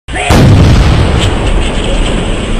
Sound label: sneeze; russians